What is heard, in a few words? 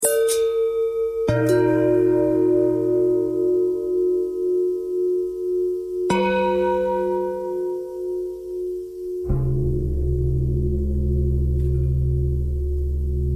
bell longbell thread bendthread